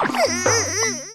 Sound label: combo break; Minimal Weeb - Noel v1.3